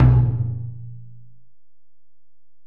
Tom, Alesis DM-PRO, Drum Machines, Toms

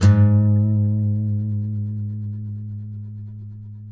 COLLINGS G#2, Guitars; Spectrasonics; Hans Zimmer Guitars VOL-2; FNGSTYLE PFM

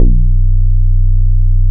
BASS, Bass Analog & Synth; ANATOMIC; USB Soundscan vol.12; AKAI

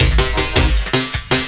LOOP, 160 BPM, Drum & Bass

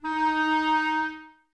clarinets, Wood Winds; Miroslav Vitous - Woodwind Brass Ensemble; 90's Sample CDs

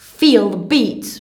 VOX, Singin

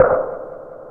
snaper C3; SNAPERS

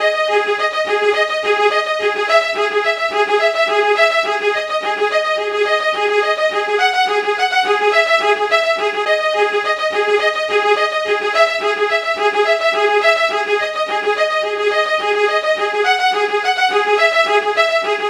Strings Arpeggio; Sonic Foundry (Sony Creative Software); Club Joints and Street Anthems; Lions Den

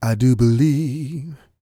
SING, Vocal Planet R&B & Dance; ILIO

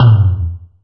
TOM, House Side Of 2 Step

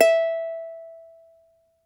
uke E4

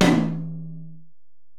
Tom, Alesis DM-PRO

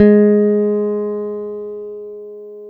G#2 JAZZ GTR; Univers Sons; Jazzistic

